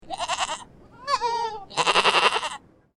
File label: Goat Three Baby Goats, Three Bleats from three different goat babies; Goat Sound Effects